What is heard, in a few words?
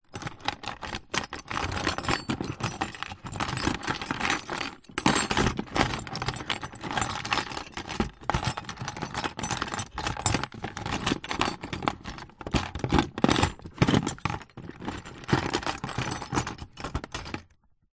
industrial tool box plastic rummage